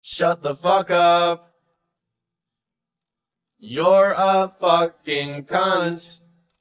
vocal only

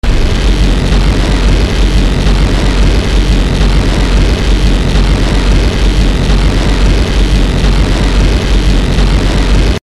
laser beam

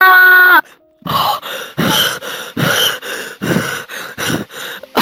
screams

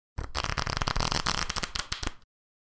card ruffle

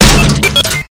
kick; sentry buster

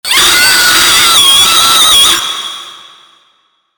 arcade jumpscare sound

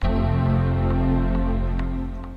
mac startup; for iphone

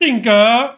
ding ge

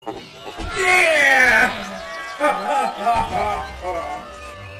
yeah hahahahahaaaaa

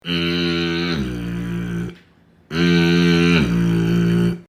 foghorn guy

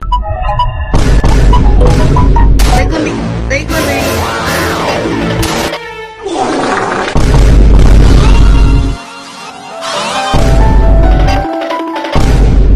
goofy ahh sounds; tiktok

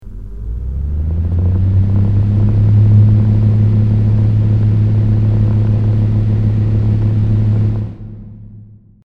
fan

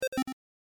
key hit

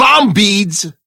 bombeat

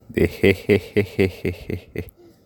hehehe

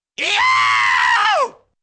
yell; peppino

